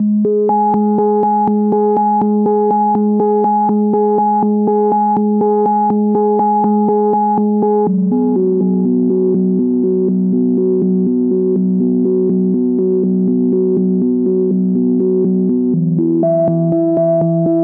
122 BPM Melodic Arps D maj B min Tonic D; MMB

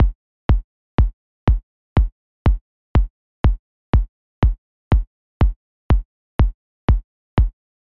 122 BPM Kicks Step 1; mmb drum arsenal vol 1

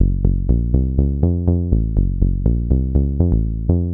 122 BPM Bass Synth C maj A min Tonic F; Pro Bass Synth Studio Kit; MMB

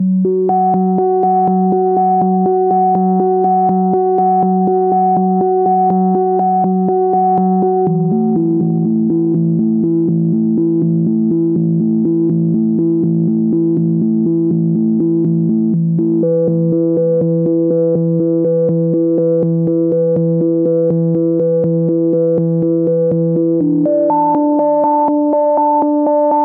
122 BPM Melodic Arps C maj A min Tonic D; MMB

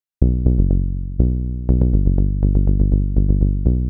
Bass Synth Dmaj Bmin Tonic G, 122 BPM; Pro Bass Synth Studio Kit; MMB

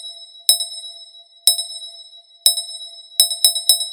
122 BPM Metal Glass; mmb drum arsenal vol 1

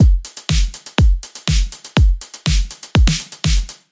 122 BPM Battery Full Drum Forge; MMB

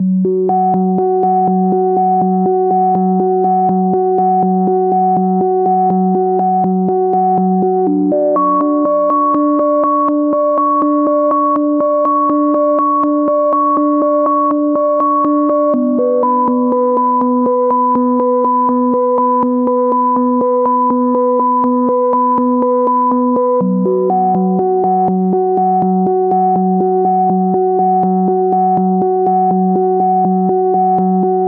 122 BPM Melodic Arps C maj A min Tonic E; MMB

